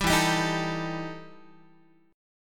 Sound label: E Minor Major 7th Double Flat 5th E Guitar